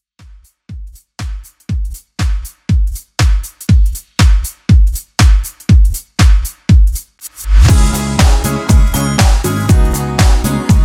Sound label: 90s Extended Mix 120Bpm